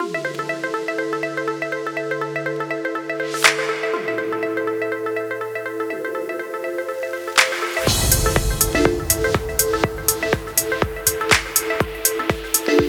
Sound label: Chillout; Unison